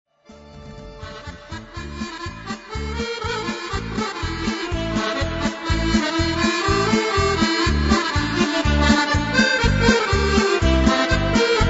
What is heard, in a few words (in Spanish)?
pasodoble, accordion